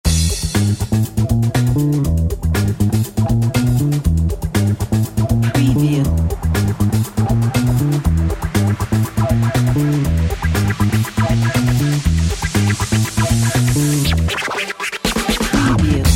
upbeat energetic funk

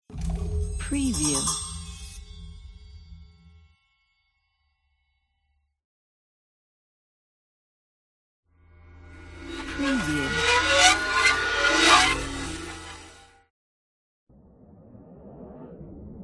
scary sfx

